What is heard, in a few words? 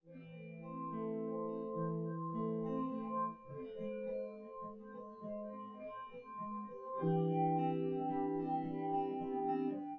no drums synth conv 5cm sim; SASSEC07